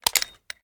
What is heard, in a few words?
plr sm beta reload fast xmag mag out; gmod